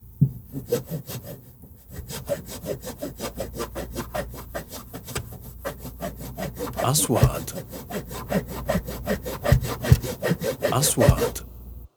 منشار; sound effect

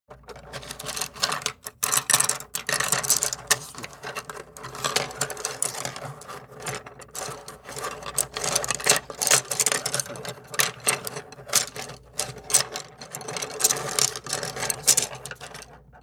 جمع الواح خشب, woods; sound effect

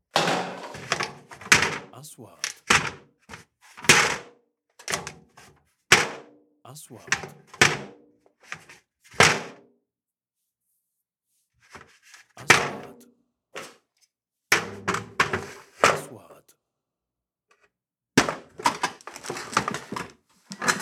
خبيط بالمطبخ; kitchen

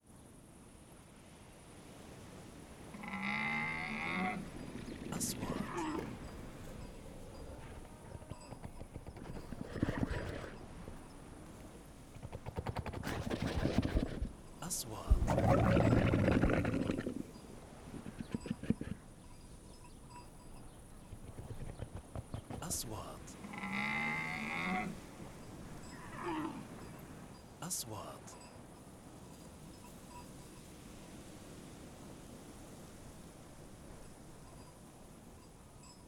صوت الإبل; sound effect; رمال

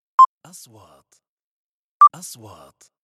نغمة الكترونية; transitions

